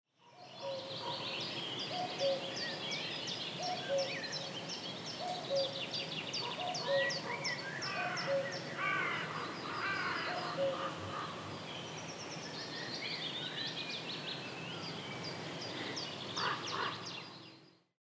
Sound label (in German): Kuckuck, Enten, diverse Singvögel am Wasser